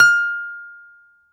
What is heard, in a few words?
BALALAIKA, Russian Strings; E-MU Producer Series Vol. 7 – Old World Instruments